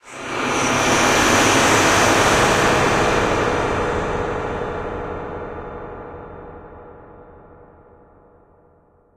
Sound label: Swell; friday the 13th killer puzzle